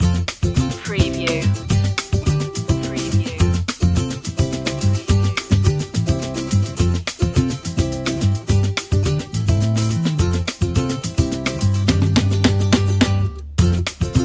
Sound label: easy life loop